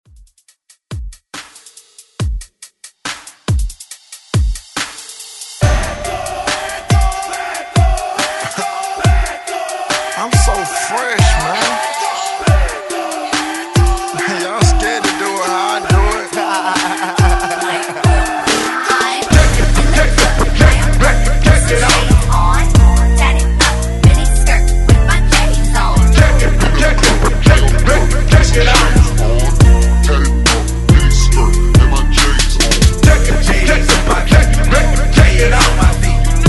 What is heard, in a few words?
Hype Scratch Re Drum 70 Bpm